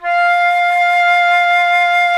Flutes; Club-50 - Foundations

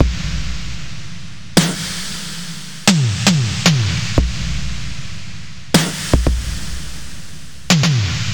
115 Beat Skipper; Zero-G; Future Wave

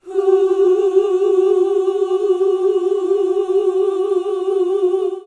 OOH F#3, GOSPEL; Best Service; Hallelujah; GOSPEL 10

